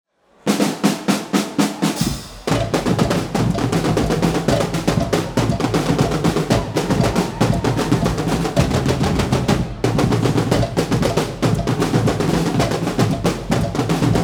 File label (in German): Rhythmus